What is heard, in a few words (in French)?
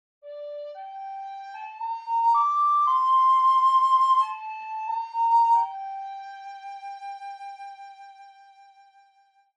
flute solo debut